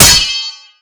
blade 1 hand parry

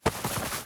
cloth up

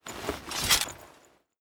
axe 1 hand sheathe

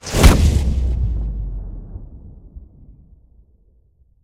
shout slow time on

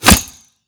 bow fire

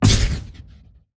ward block

